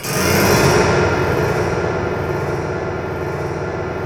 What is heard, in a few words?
PNO HRP; Roland - LA Composer vol. 1